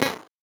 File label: VocoBit Noise, 8-bit; musicradar; 8-bit bonanza